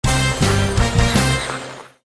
Normal Win; hockey

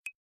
Max Bet Button; slotssister

